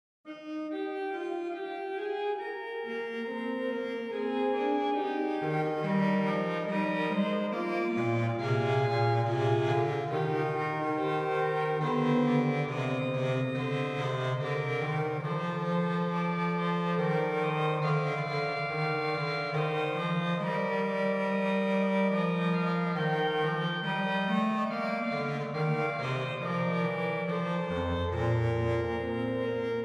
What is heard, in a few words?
Trio, organ; Livre Souvigny